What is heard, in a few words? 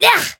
Voice attack